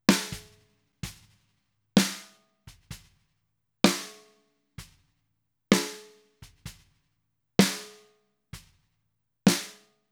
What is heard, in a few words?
snare; ferox